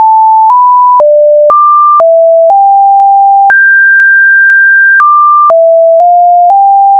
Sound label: high pitch